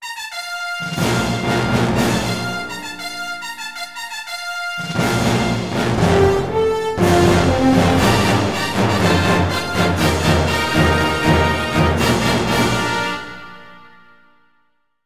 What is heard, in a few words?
Victory; Heroes Over Europe